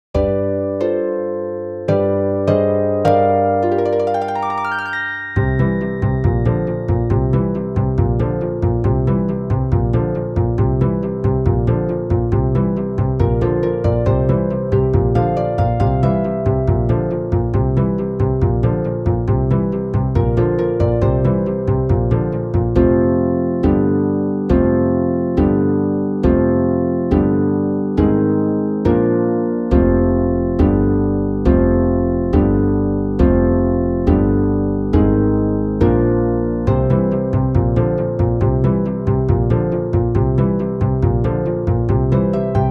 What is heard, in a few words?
Backing Track Original Key, cello; Legend of Zelda OoT